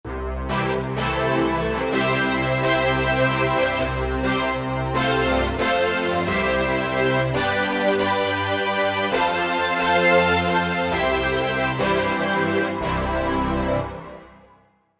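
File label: Stereo Brass, Korg MSC-1S; Stero Brst; Korg MSC-1S Variety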